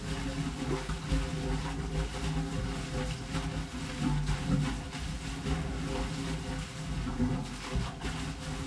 water pipe

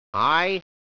fall; baseq2

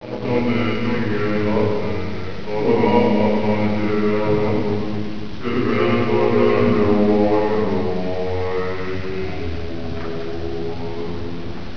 chant

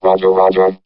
jump; droid

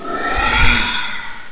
pain; wraith2

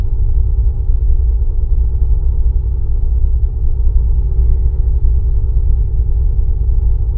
atmosphere

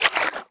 DROWN; vortex

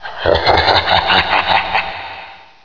death; necro

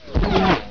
r tele

